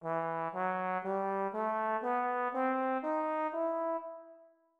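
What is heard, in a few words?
harmonic minor E3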